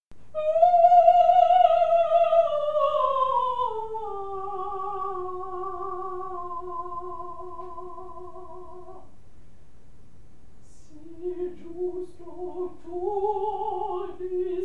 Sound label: o mio fernando acapella